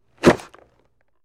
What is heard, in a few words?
Football Helmet